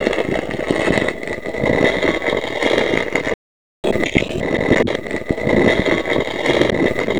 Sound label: Resynth Stone Slide